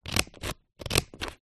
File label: office scissors cut paper close up; zapsplat